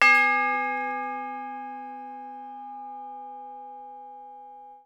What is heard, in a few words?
bell A3